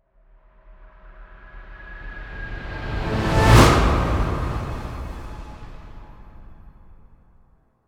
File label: Swell To Impact Orchestral demented laugh added; bottlerocket